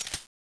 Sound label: fiveseven slide release, weapons